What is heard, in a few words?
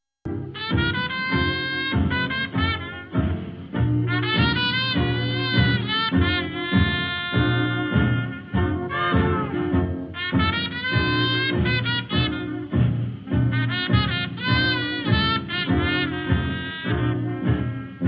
blues